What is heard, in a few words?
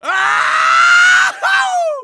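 scream fall, voice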